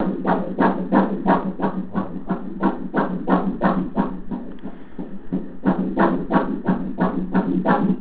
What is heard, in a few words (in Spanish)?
Corazon, embarazo